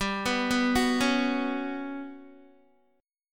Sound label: G Major 7th Flat 5th, Guitar; arpeggiated; JGuitar